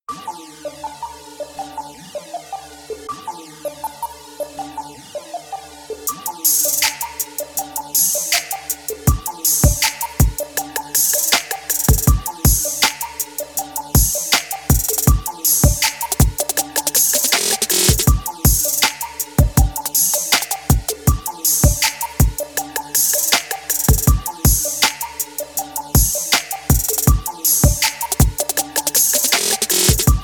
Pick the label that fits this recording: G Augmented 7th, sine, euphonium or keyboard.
keyboard